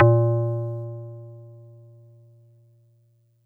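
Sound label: Marimba DX100 A#3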